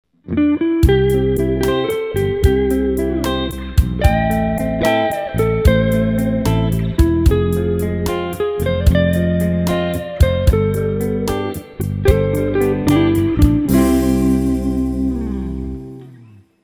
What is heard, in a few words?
slowrock